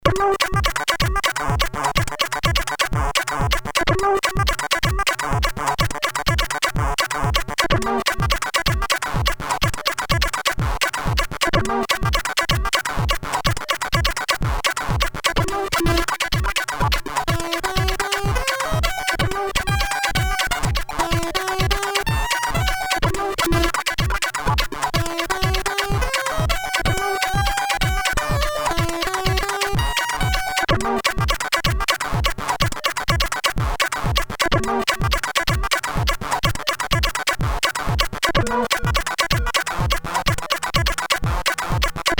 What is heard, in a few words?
Another Funk, CSG8580R5; hvsc